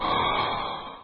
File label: breath